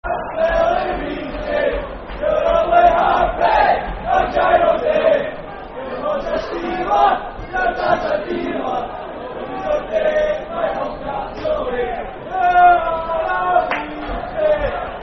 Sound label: Football Chant (Soccer Song); You Are A Weagie; Livingston Lfc